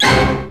ORCH HIT; AKAI; Rubber Chicken Software Sample Bank; DRUMS + PERC

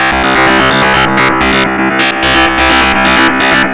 GRUNGE

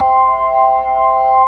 B3, Drawbars; Keyboards; E-MU Producer Series Vol. 2; More Studio Essentials

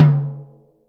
Jazz Mid, Acoustic Tom; Yamaha DTXpress

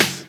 CLAP, Dance Drums

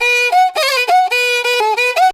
riti grooves; Best Service; World Colours

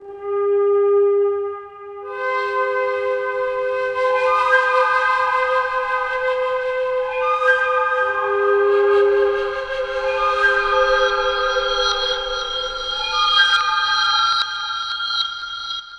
Strange Distant Flute, Ethnic Instruments; Sonic Foundry (Sony Creative Software); The Electronic Music Manuscript Collection

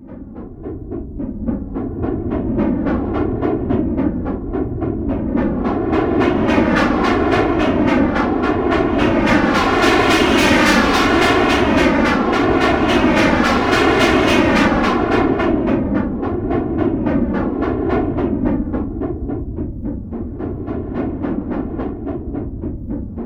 PHASE TRAIN, PHASY TRAIN; Zero-G Ambient 1

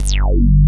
TECH REZ BS; Pro-Rec - Synthsonic; BASSES

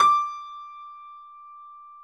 D5 YAMAHA, GRAND; NS GRAND

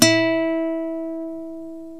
BASIC ENSMBL; OMI - Universe of Sounds

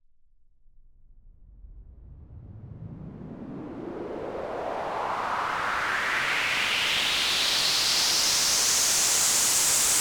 Long Noise Sweep

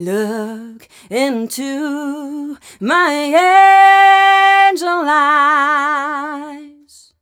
f mel d, fem Vocals

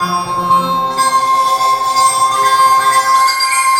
Dark Oriental; Sonic Foundry (Sony Creative Software); Synchro Funk

